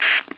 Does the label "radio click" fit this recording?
Yes